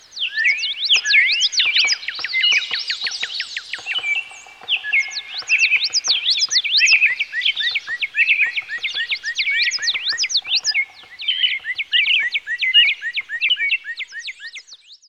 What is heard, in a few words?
Backyard Birds Sound